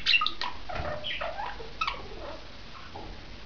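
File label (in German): gurrgesang, wellensittich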